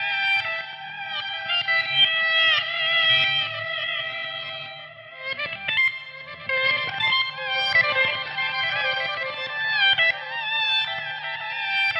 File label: E Guit Solo, ambient